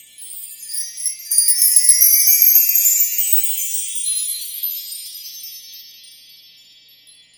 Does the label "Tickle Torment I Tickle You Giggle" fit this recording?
No